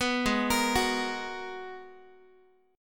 G sharp Minor 9th, Guitar; arpeggiated; 14,16,13,16,x,x; 4A,4E,5A,5D,5Gsharp,6Csharp; G#m9

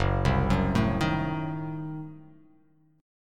F sharp Diminished 7th F sharp

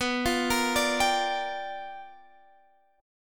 G Minor 7th, B; Gm7/B arpeggiated; JGuitar

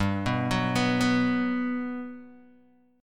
G Major 7th, G, down, Guitar, Open D

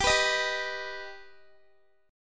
G Augmented G Guitar; strummed

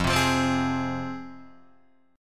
F Minor, Guitar Open D

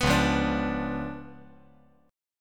C sharp 7th Flat 9th, chord; strummed

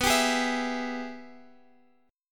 Ab Minor Major 7th Sharp 5th, B

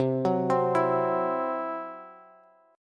C Major Flat 5th, C, down, Bass

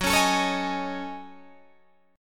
F sharp Minor 6th Add 9th, Guitar, Drop D

